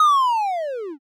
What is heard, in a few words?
slowmo